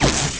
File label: sparkle; Undercroft